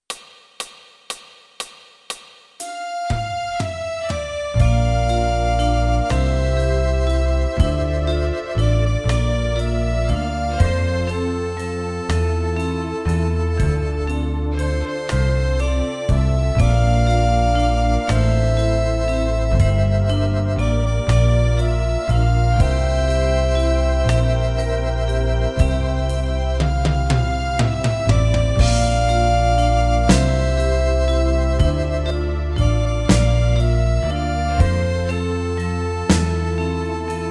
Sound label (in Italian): Fisa tutti